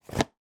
food egg box carton close; sound effects one

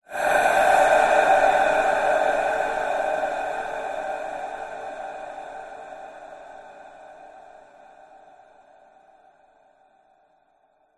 horror breath sinister evil large reverb; zapsplat

